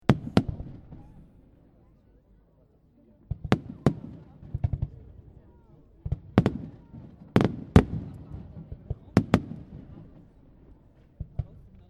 Fireworks Display, Various fireworks / rockets / flares bang; Various fireworks / rockets / flares bang and sizzle above light city crowd.; Chinese New Year Sound Effects